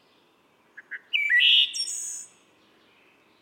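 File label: phrase, wood thrush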